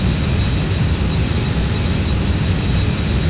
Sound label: industrial